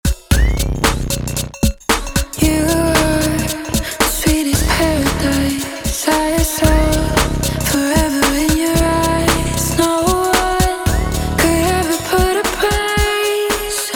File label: Gig book riff